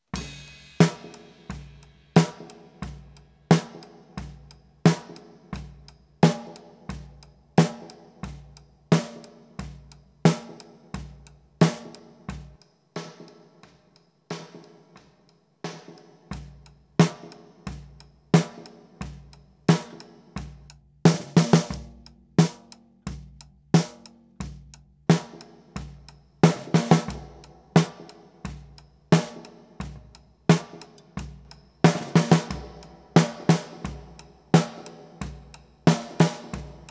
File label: AKG BX20 Snare